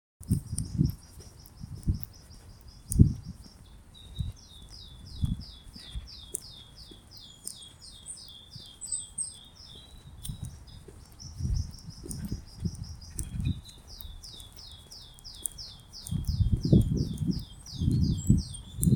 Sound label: mystery bird